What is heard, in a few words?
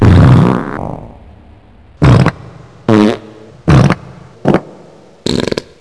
fart